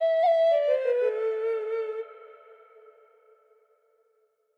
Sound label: OCARINA DROP